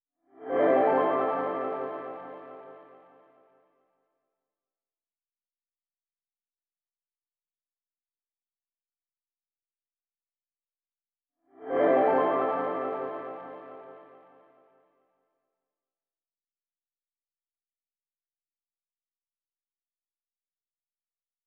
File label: 172 BPM A Min Arp; Melodies; Cymatics; 2022 Melody Mystery Expansion